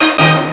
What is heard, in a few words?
orch hit